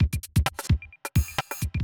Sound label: electro kit 130